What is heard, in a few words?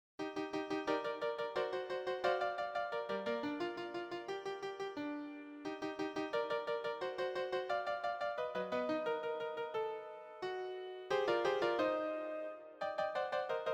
Cactus Wren TEN